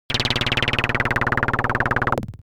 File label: game fx wobble; smartsoundfx